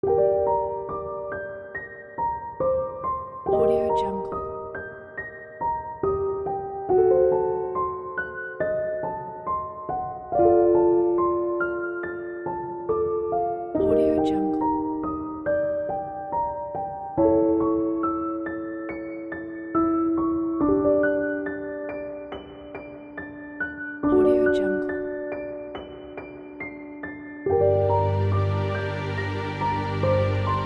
cinematic sad beautiful music

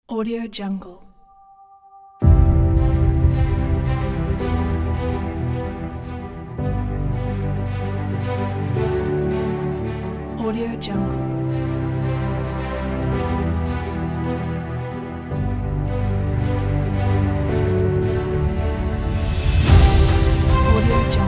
epic music

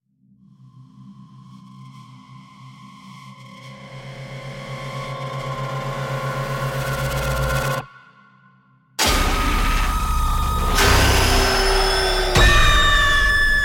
horror jumpscares sound fx; just sound effects

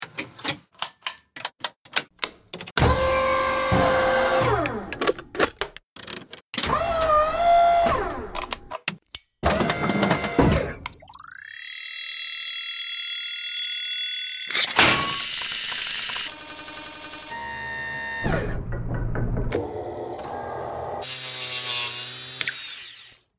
mechanical sound fx; big room sound

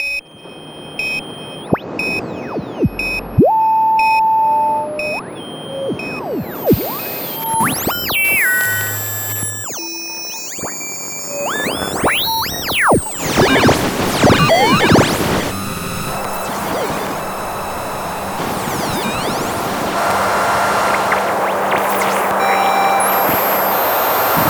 signal communication sound effects; bluezone corporation

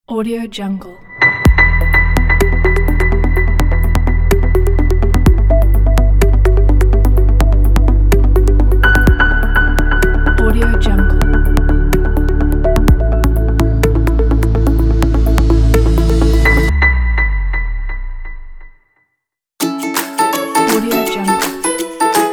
inspiring logo music

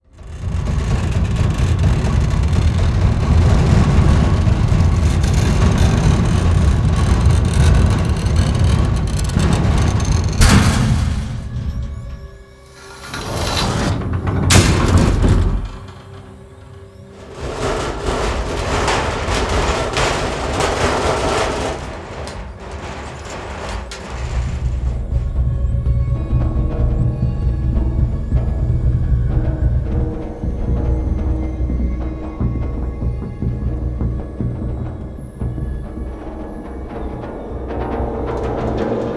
metallium sound fx; cinetools

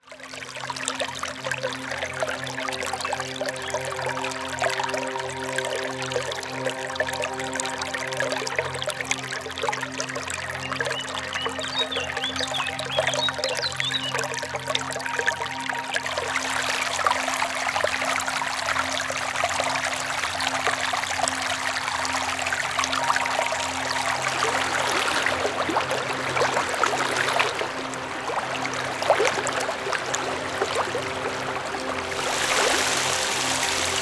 water flow sound fx; cinetools

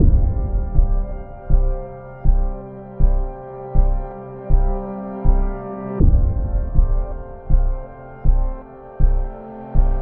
ambient underscore sound fx; ghosthack

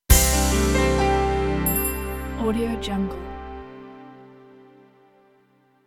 piano welcome ident music

